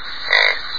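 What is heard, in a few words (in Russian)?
ворчание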